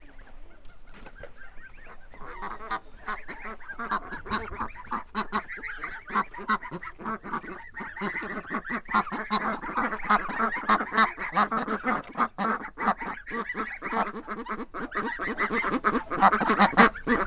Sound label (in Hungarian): kacsa kavalkad es kiskacsa, hazikacsa; állathangok; paraszt udvar